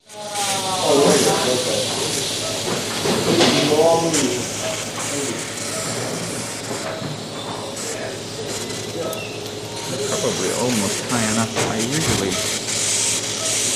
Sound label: Op Room Ambience, hospital; hospital & lab